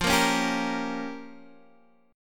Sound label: Bb Minor 6th, guitar